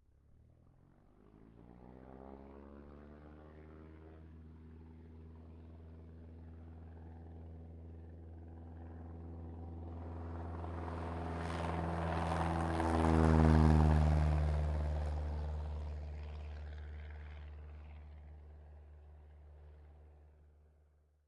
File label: cessna 172 ext take off up by take off away; Sonniss GDC